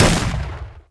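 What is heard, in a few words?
soldier attack; lmctf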